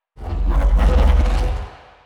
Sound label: neuro, bass